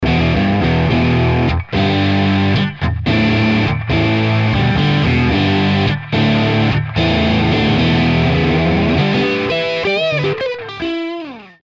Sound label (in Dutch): elektrische gitaar riffs